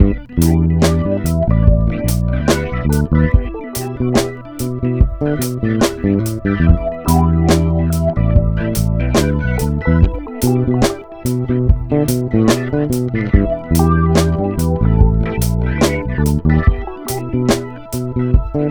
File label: ELECTRO LOUNGE